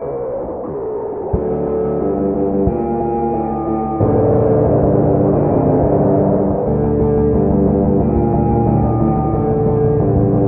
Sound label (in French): Guitare feutre